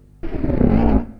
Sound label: doors